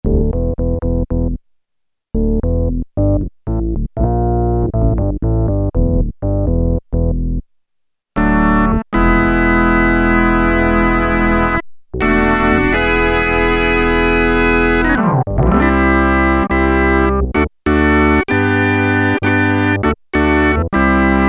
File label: Rock Organ, Prophet-5; Sequential Circuits Prophet-5 (Ver.3); Factory Patch; File 2 Programs